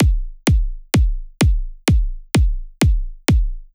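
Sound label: Drum Loop 128BPM bass drum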